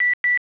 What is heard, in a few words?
tweeting; 3CXPhone